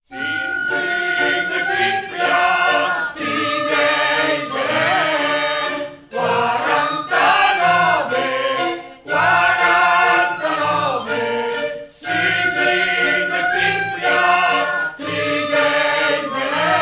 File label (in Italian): firlinfeu e coro; brianza